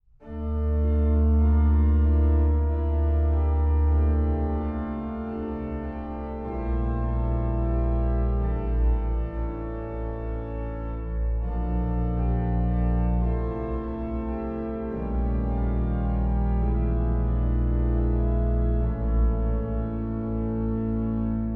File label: chant du soir, organist